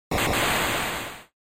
SNES RPG Encounter

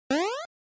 Jump; NES Action

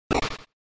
SNES Action Damage

